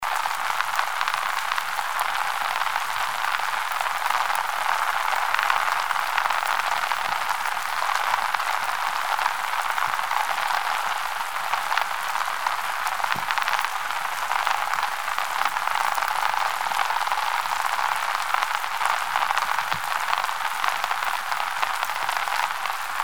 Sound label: Underwater Sound Kina